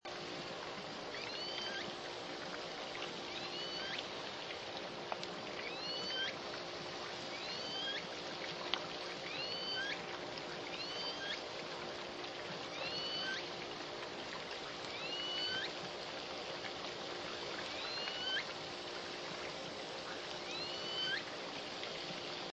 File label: Lpod S19 calls midnight